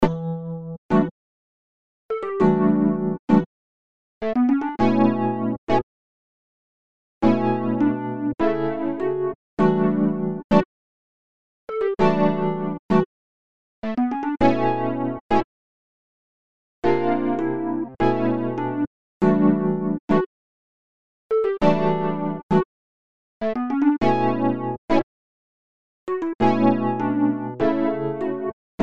e piano loops

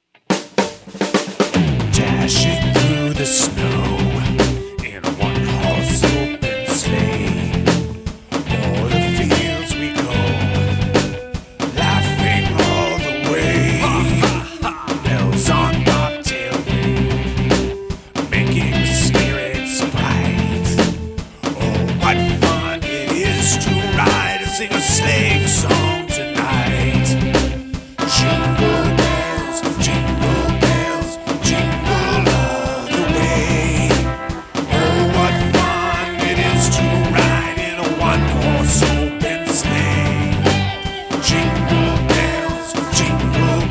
Jingle Bells Hot Chocolate and Rum Mix

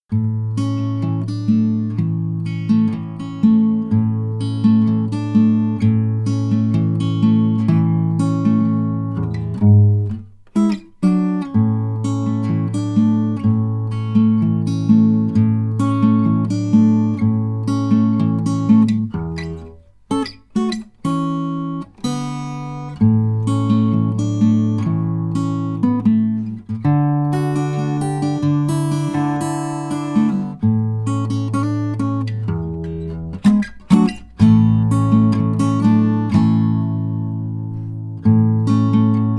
Acoustic Blues Folk A 126 BPM

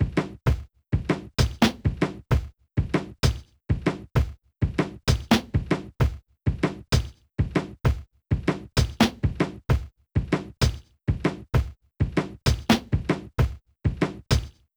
drums

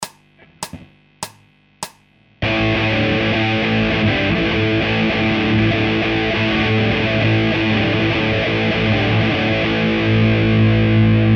Hard Guitar Loops

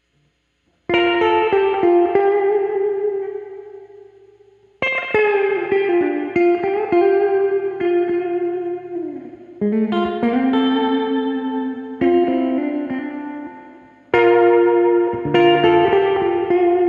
surf guitar

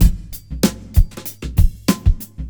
Drumloop; ccPlus Striper

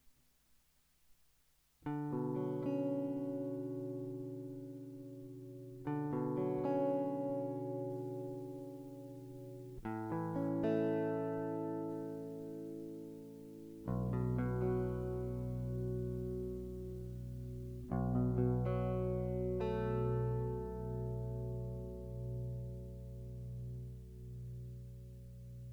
Ambience of Uncertainty

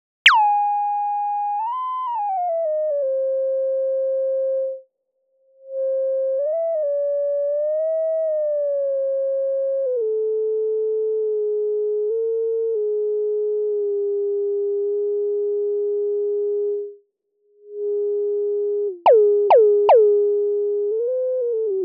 F120 Impro noise2

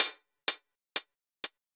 hat shots; starcym

